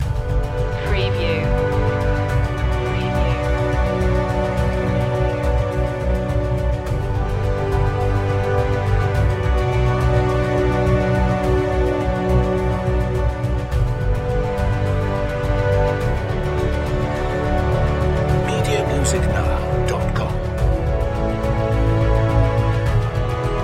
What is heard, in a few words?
age of exploration loop